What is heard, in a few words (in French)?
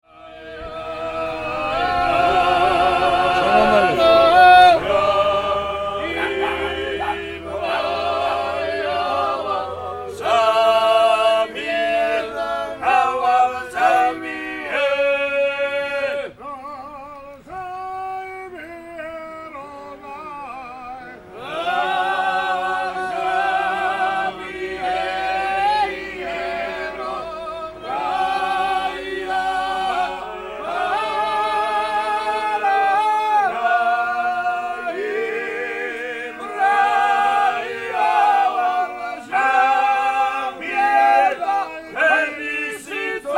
Chant georgien